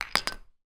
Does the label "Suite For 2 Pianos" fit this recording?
No